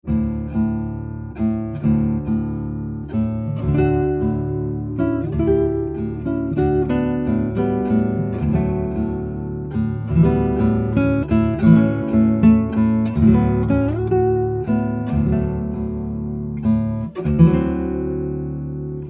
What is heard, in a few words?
Cedar, guitars; Albeniz; Capricho Catalan